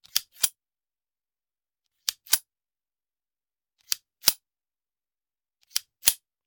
Hand Gun Foley; Sound Ideas; Guns

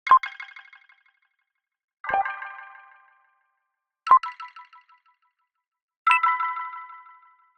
Arcade Click Neutral; boom library; The Interface

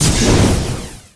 Menu Enter; Menu FX

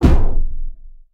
hit human hood ricochet; Blind Drive

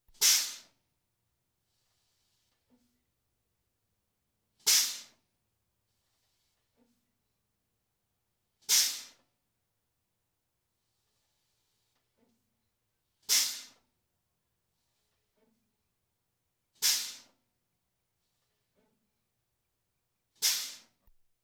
Police, Fire engines

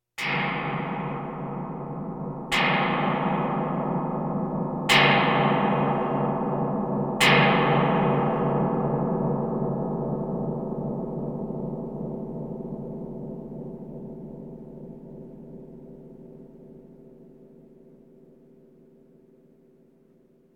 Percussion Bongos Tympani rolls Gongs Drums

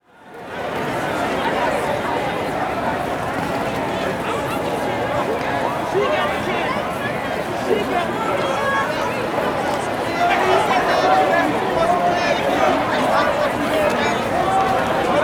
Crowd Outdoor Active Voices Movement; Walla; Sound Ideas; Walla Series

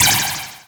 Forcefield Ripple Impact; Energy & Forcefields

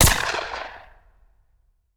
Rifle; Sound Ideas; Guns

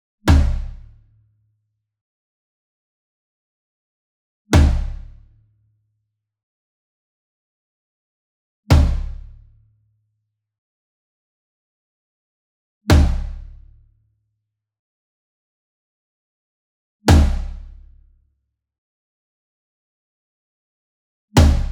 SINGLE DRUM Tom Concert Hit Hard Beater Near; boom library; Cinematic Strikes Construction Kit

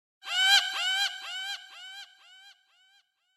Gull Eerie Croak - Flies Away; Hollywood Edge; sound designer's toolkit v2

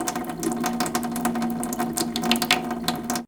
water dripping; best service Blue Box; water fx

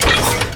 Gun Weapon Pickup; Pickups

